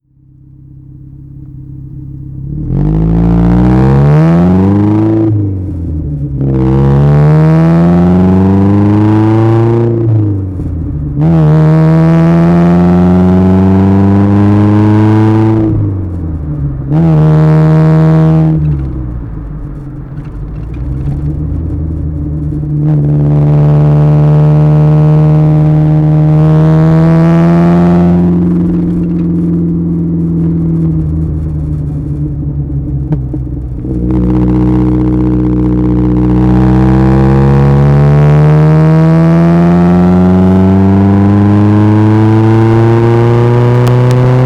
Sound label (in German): alfa romeo spider weber doppelvergaser berg fahrt auspuff